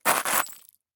stop glass; Escape from Tarkov

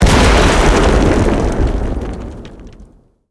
building hit; tanks; War Thunder

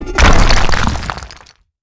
stone building; World of Tanks Blitz

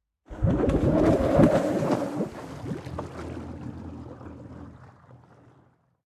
torpedo; World Of Warships

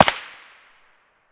soil distant; Escape from Tarkov

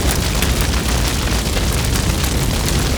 fire med loop; Call of Duty Modern Warfare

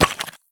sprint tile; Escape from Tarkov

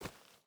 footstep barefoot dirt walk; Rust

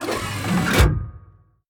gun reload; World of Tanks

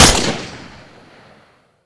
m240; Modern Warfare

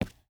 footstep boots concrete run; Rust

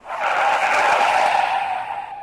Wheel Slide; Effects; DCS

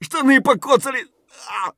hurt light; Escape from Tarkov; scav5

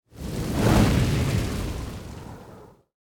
damage vehicle; World Of Warships

